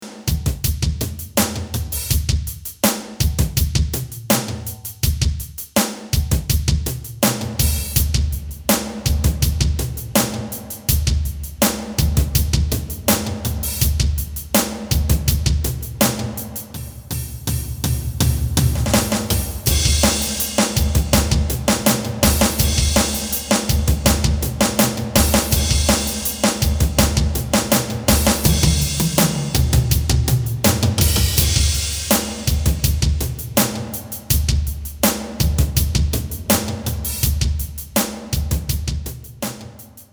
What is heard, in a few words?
Lexicon PCM70 Gymnasium Drums